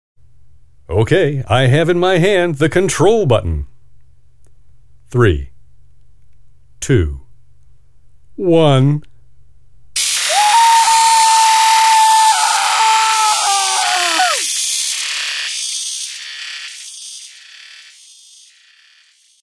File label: Electric Death; voice work